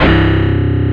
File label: HARD BASS; SAMPLE WAVE MIXING 1